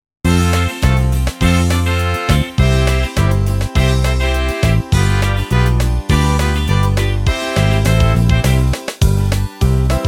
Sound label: Karaoke